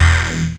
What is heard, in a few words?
DR. LOOP, BUZZ; X-Static Goldmine 3